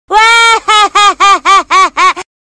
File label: MISCHIEVOUS CHUCKLES RINGTONE; SHINCHAN